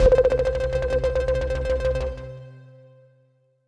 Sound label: Synth C, Synth Prophet One Shots; Electronisounds